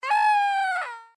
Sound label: scream; Adventure Time - Elemental; candy